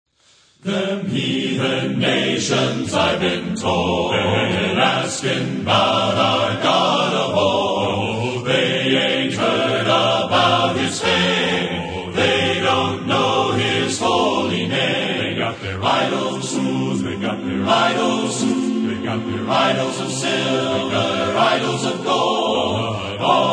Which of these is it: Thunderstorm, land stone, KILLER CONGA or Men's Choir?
Men's Choir